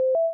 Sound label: boop